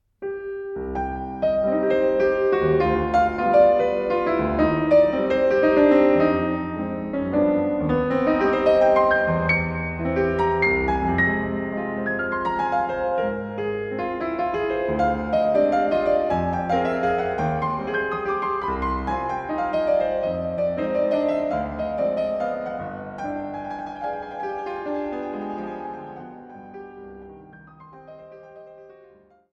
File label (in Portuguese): Valsas de Choro